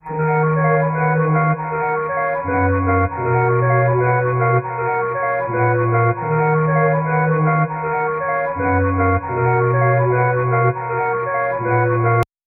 157 BPM D# Min Flutes; Melody; Loop Stems; Cymatics; 2022 Melody Collection Platinum Expansion - Pt 2; Psychic

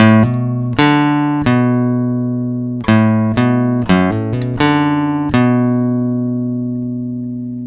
Guitar - 125 BPM (B Minor), Acoustic Guitar; Wild West; Ghosthack - Free Guitar And Piano Samples

